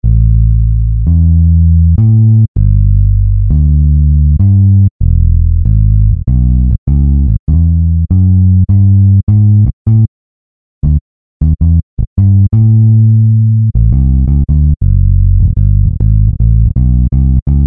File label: Fingered Bass; synthesizer; Guess the synthesizer game